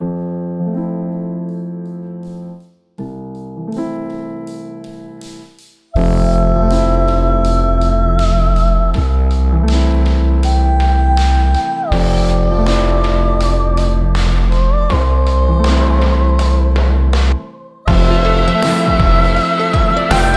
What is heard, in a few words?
Lullaby; Cadence of Hyrule